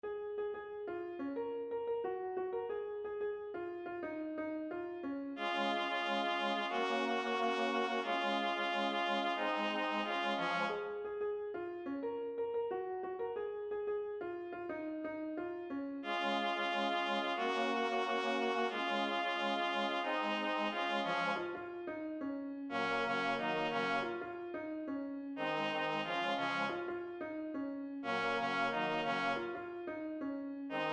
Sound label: Midi